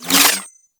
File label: Parazon Hack Interaction Out